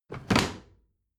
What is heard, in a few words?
bathroom drawer close heavy